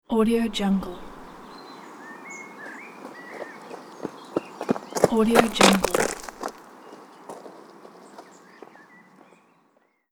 running on gravel path

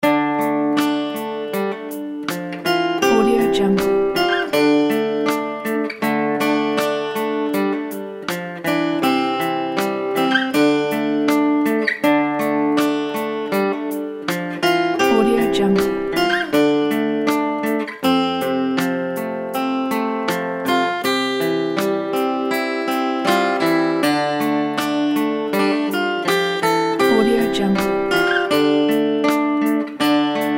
western folk loop

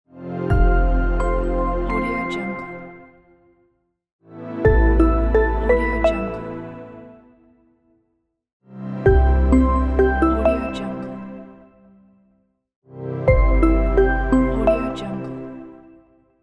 short logo intro

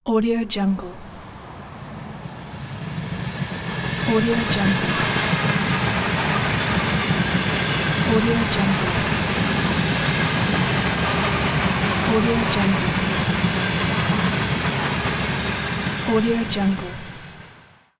fast train rides

